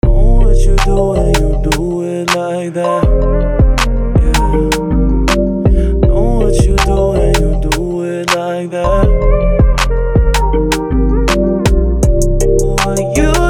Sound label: Bells with vocals